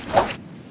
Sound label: knife slash; cstrike; CPY JAIL; Fists